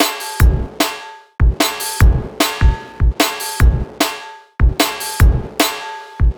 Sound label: Beat, Futurist Drum & Bass; Drums & Percussion